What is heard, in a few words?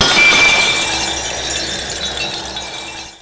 GLASS